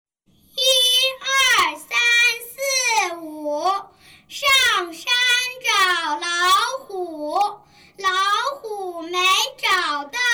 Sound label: Song Counting 12345